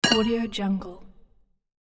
horseshoe hitting stake; hollywood edge

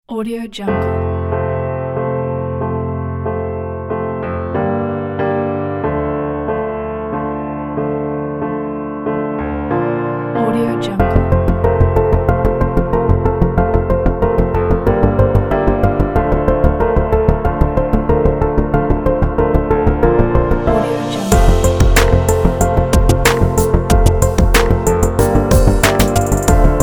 atmospheric pop

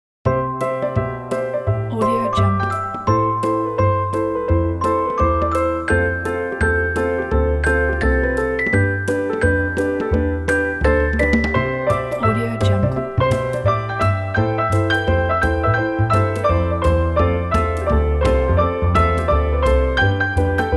happy summertime

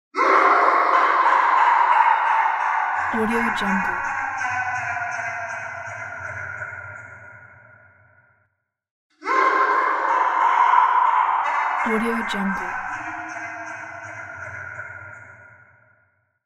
mad man evil laugh

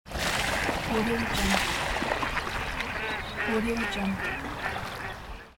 duck landing in water

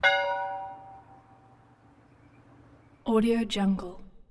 church bells

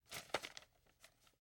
notepad movement

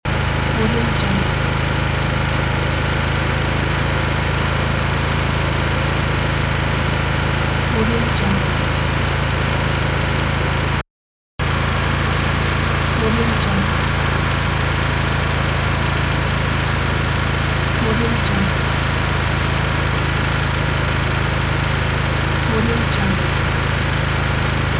diesel generator

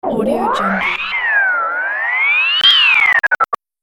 glitch fx for media productions

